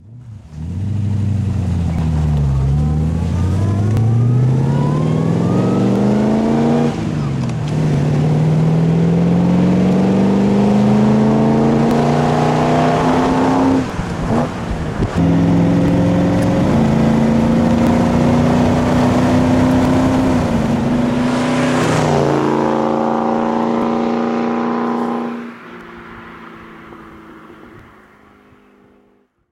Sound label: Daimler SP250 Dart